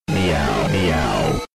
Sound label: meow